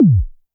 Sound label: korg trident